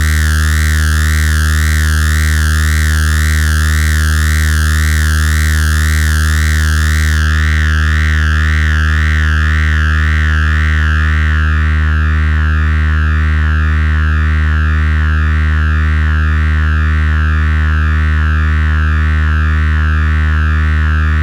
weird drone; NOISE TOASTER

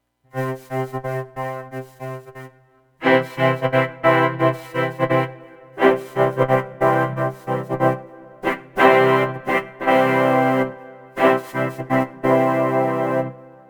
bigband, vocoder; analog synth

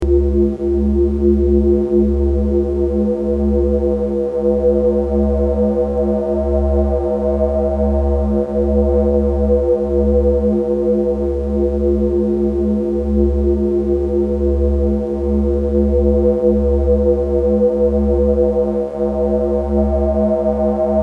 Four Voice Drone; analog synth

